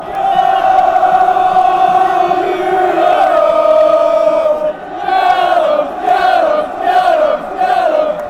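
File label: come you yellows, football chants; tufc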